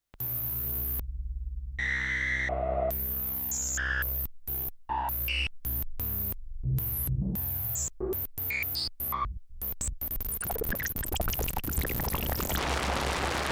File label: T-6 Chaos LFO